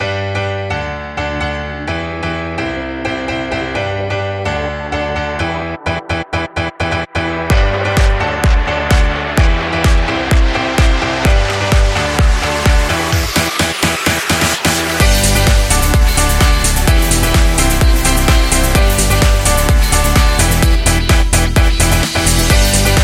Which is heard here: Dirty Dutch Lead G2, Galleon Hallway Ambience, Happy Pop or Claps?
Happy Pop